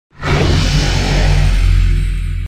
lvl up sound; csgo; GFL; gungame

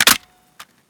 m60 cover down; garrysmod

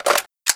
famas clip in, weapons; garrysmod

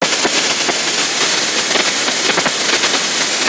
static glitch; slender; arrival

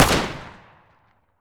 famas fire, weapons; garrysmod

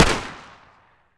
mp5 fire; weapons; garrysmod

